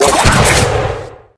Overhead kick

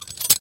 Tank close

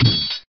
weapon land

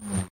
swing

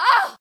Scream

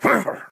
Scream

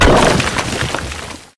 stadium bomb

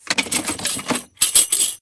Chain up

